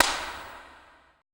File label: E CLAP, ETH PERC